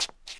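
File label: concrete stop, footsteps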